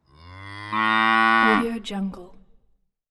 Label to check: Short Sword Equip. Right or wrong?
Wrong